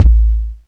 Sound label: RAPIN, KICKS; Zero-G; Funky Elements